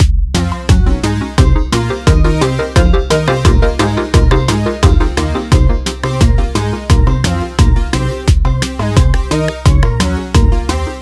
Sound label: Zen Game Music Loop